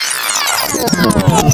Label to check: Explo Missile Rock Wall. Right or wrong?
Wrong